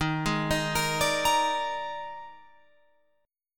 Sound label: D sharp Major 7th Suspended 4th, D sharp, down, Guitar, Open F; arpeggiated